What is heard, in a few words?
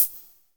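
hi-hat, drums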